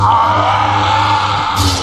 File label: Screech; Palpatine